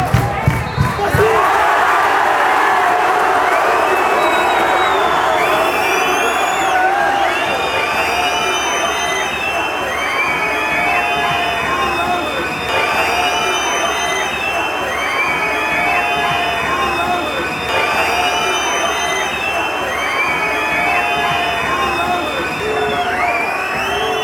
boo salzburg, fan chants

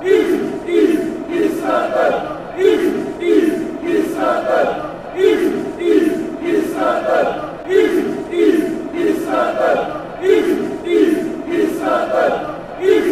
east london, fan chants

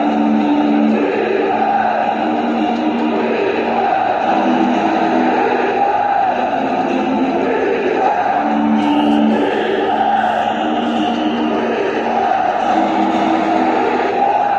milwaukee bucks defense chant

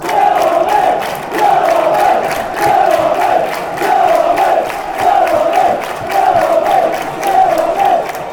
yellow army, fan chants

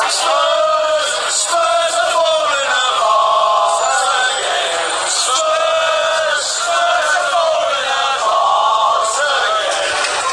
spurs are falling apart again, fan chants

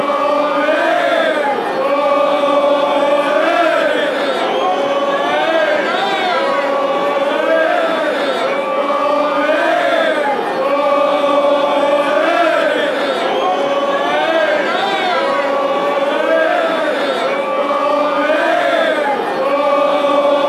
pass ball ole, fan chants

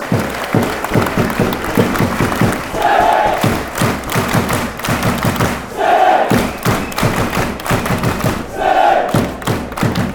city claps, fanchants; york

